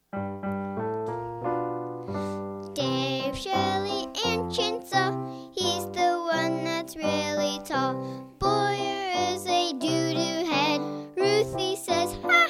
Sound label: Jingle